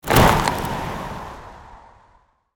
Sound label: Ice Shuriken Throw; Spinjitsu